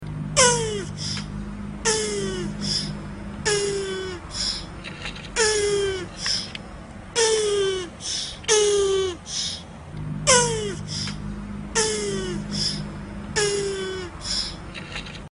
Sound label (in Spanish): TORTUGA SONIDO; el morrocoy fumador